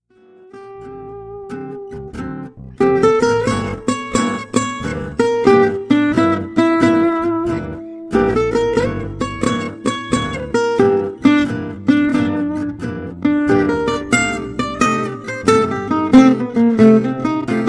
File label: Minor Blues 3rd Chorus